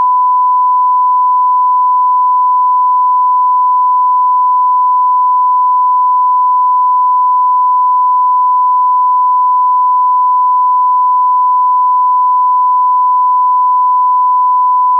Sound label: sine 1khz mono